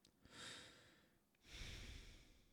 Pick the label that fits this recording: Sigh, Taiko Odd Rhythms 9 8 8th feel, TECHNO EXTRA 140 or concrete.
Sigh